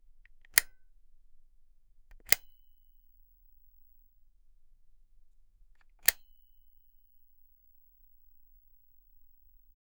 incandescent light bulb

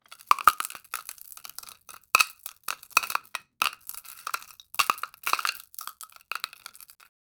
ice cubes in soda; beverage

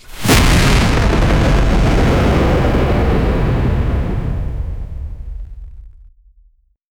glow like hit sound start, laser effects

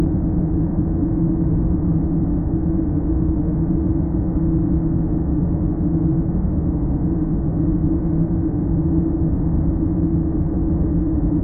deep industrial hum massi, air circulation

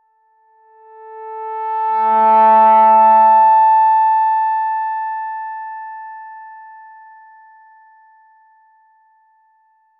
a very soft pad, fast decay

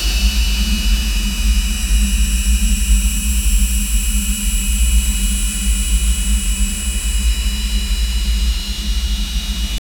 dark fantasy themed

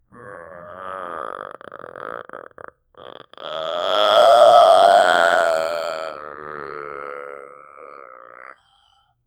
funny zombie sound, comic

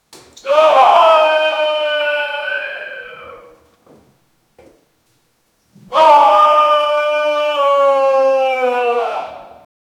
an old man falls into, well

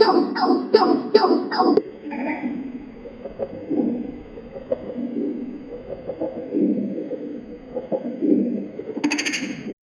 fairy sound; Winx Club

